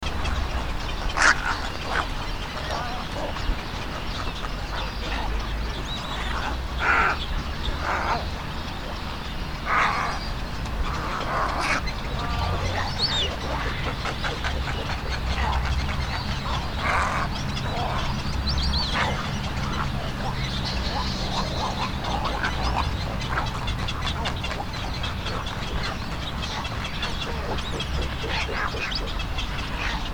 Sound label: Dimorphic egret